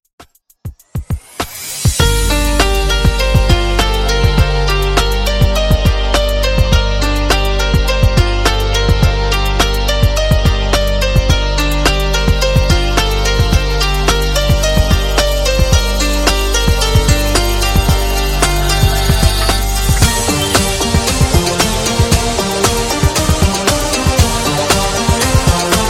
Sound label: Funk Transition 100 127Bpm